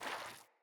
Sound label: Rat Guide Water Step, Foley